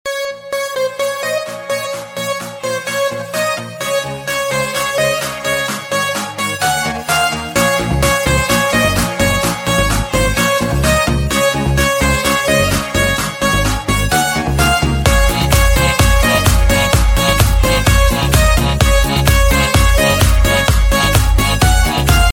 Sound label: Ringtone for iPhone